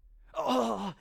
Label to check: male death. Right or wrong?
Right